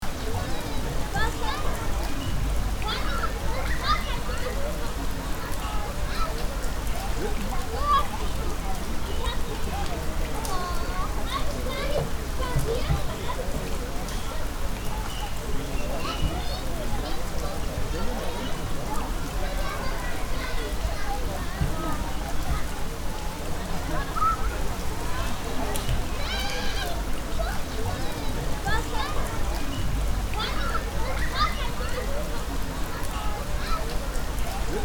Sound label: Urban playground rainfall playful voices